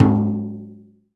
OPEN HAND, Timbale; Timbale Battery

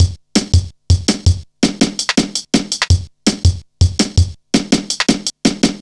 165, Drum Loops, Drum & Bass; Total Drum&Bass

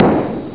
sdrum; ST-XX Amiga Soundtracker Sample Packs

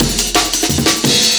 Secrets Amen, breaks

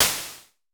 SN Basic, Vermona Mono15 Drums Snare

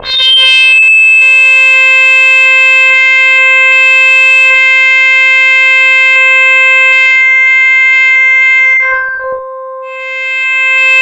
C5, SynthLine; 90 s Sample CDs; NiceBeats; SynthLine VOL-21

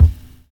JABO KIT; Univers Sons

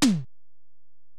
Tom, Alesis DM-PRO, Drum Machines, Toms

